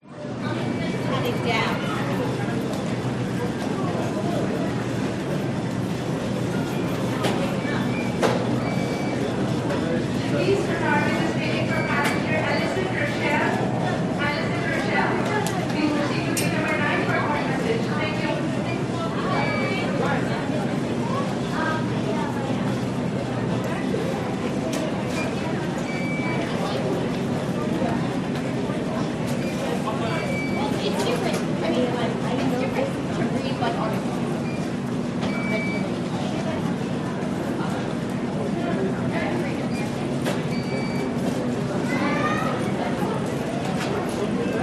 airport ambience jfk walla busy crowd

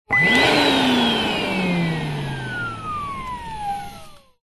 household vacuum cleaner on off burst blade; vax

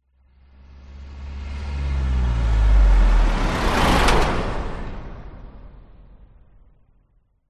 truck rigid body pass

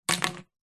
industrial tube plastic thin drop floor

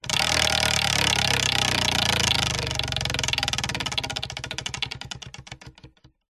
leisure wheel of fortune wooden spin slow

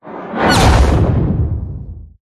whoosh; Audio Mangler

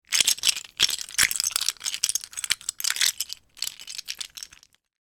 toy lego blocks movement in hand; sound effects one

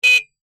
motorcycle horn honk

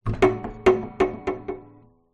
guitar steel string place onto stand

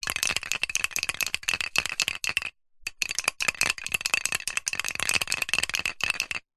leisure can silly string shake rattle bearing

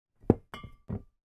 foley 2x wine bottles place down on table gently

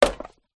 industrial axe chop strike wood single; zapsplat

